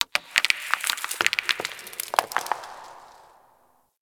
Ocean Rock Crack Small; Warframe; Grineer Ocean

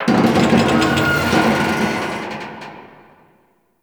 Metal Subway Car Heavy Creak; Ambience; Props; Warframe; Nineteen Ninety Nine

